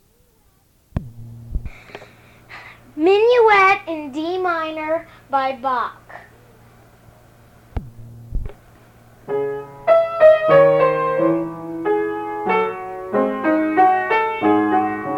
Minuet d minor; Bach

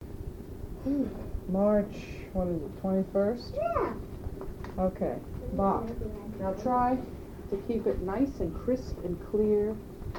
Bach with metronome

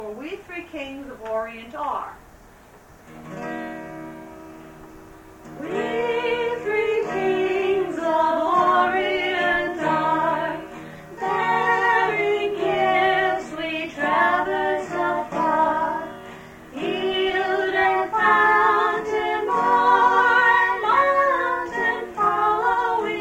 We 3 Kings - on guitar

